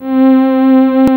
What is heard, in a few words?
strings C4, Glitch Toys & LoFi; Ableton Foundation FreePacks